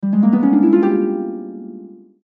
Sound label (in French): Harpe